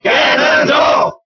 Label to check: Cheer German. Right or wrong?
Right